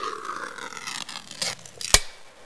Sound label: CAN OPEN, tono di notifica apertura lattina; per Cellulari Android o Iphone